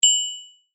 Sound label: precipitate short high bell 6 semitones higher; phet io wrapper sonification; molarity